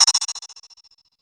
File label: crash L; Zero-G; Chemical Beats; CRASH ZOO